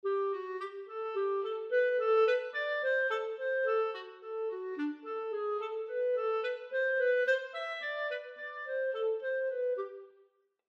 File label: Clarinet; examples in treble clef